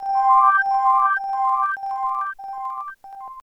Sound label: World Map Path